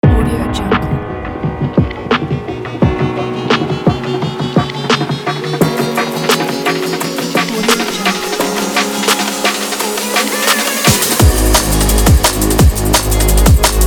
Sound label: drum and bass